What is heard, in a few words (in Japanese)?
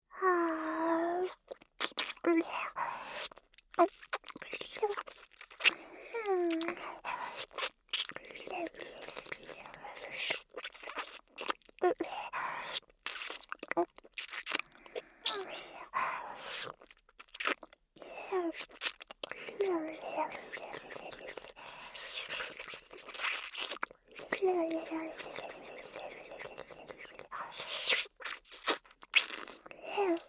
ディープキス音; ASMR